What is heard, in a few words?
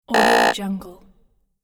wrong attempt; interface sounds